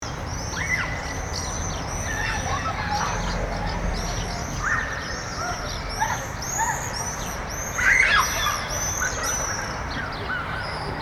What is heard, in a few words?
Elementary school playground children playing; gfxsounds